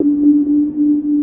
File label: ice, korg